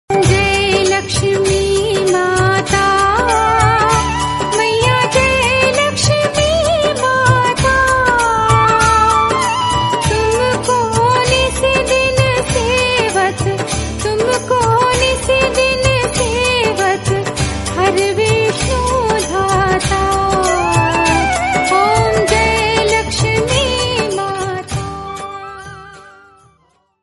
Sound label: Aarti Shri Lakshmi Ji lakshmi mantra